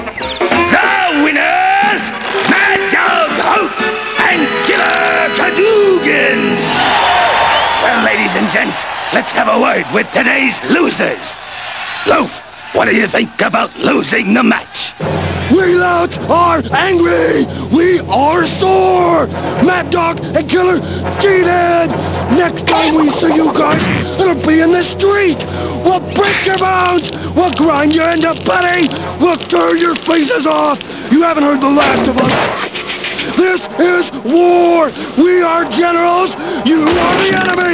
match

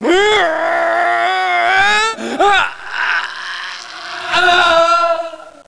priest Sacrifice; NETSTORM

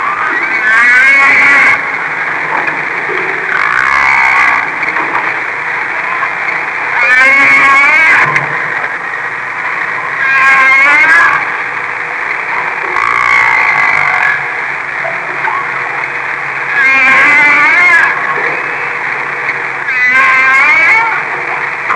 humpback whale; Sound Effects for Multimedia - Volume 1; ocean animals

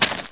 dirt, footstep

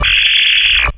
nasty gun; gfactory; weapons

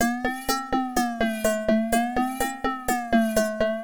HOUSE 125

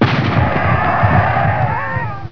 Retreat; Onslaught

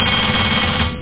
bell; TrainDriver

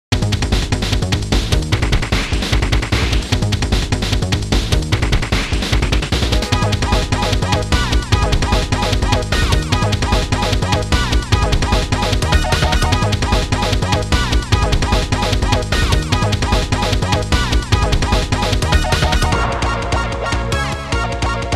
stop techno

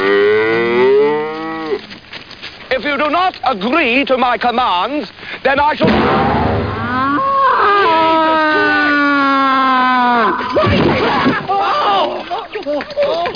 MOO

